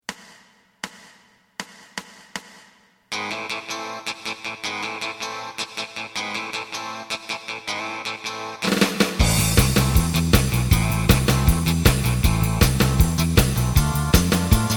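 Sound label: lead guitar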